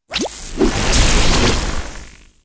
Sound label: attack; hero sound